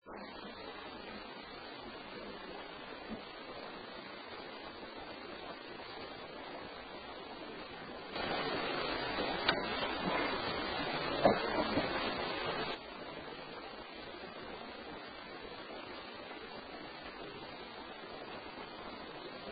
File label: Home Attic Unexplained Click; Case 021 Zepp; OAHU